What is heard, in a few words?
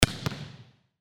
volleyball attack blocked